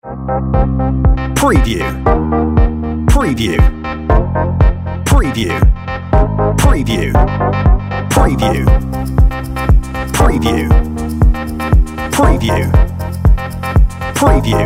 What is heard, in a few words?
On Hold Music